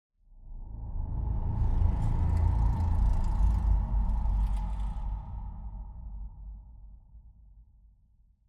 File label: active, ambient, nether, basalt deltas; minecraft